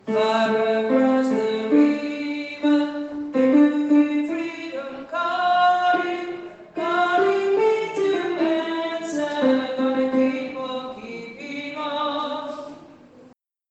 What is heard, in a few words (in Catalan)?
Sopranos - compassos 52...56 (far across the river); veu